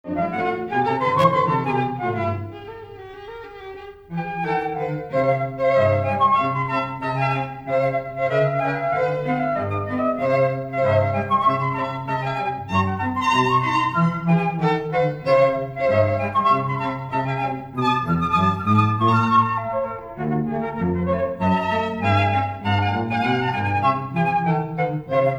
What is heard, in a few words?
Flute, Violin, Cello